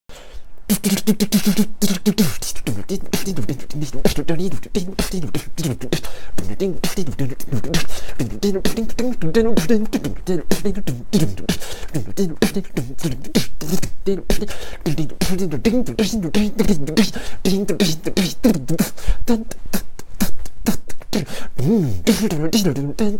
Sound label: beatbox tuney; some kinda opening; weeklybeats 2026